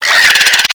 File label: Infested Biped Attack; Warframe